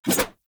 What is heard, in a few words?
Large Dagger Whoosh; Weapons; Enemy; Daggers